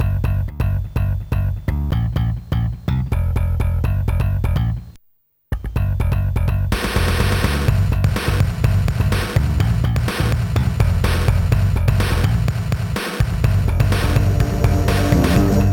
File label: mod bass line; Trackers; Modland